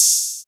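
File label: TR-808 open hi hat; Hi Hats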